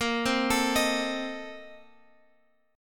Bb Major 7th Suspended 2nd Suspended 4th, Guitar, Open F; arpeggiated